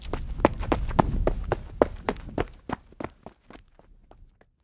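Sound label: footstep road run fade out; anemoi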